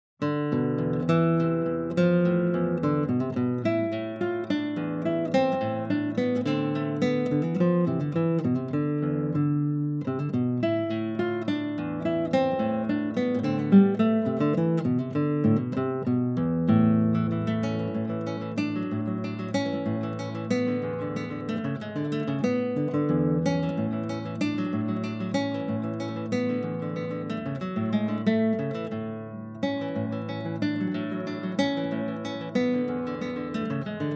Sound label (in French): Valse; Jean Absil